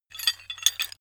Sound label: metal handling pieces